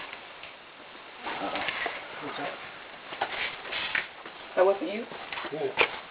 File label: school moan